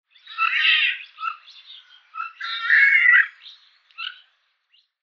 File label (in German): Mandarinente Aix galericulata, Vogelstimmen